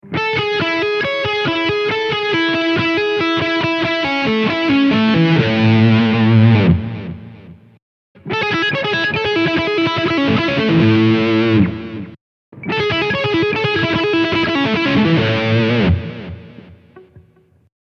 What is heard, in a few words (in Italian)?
La arpeggio, guitar